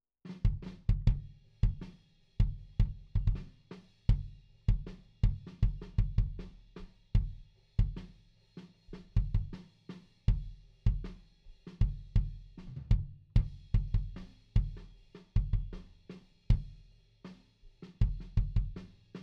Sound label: Kick IN